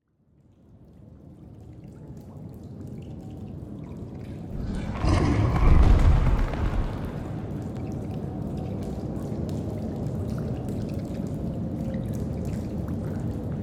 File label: amb cave; ambiance; environment track; caves